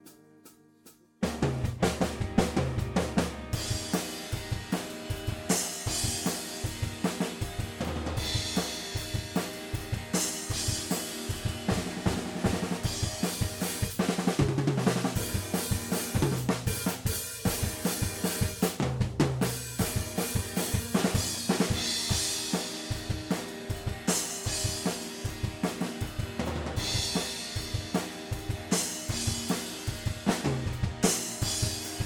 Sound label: drums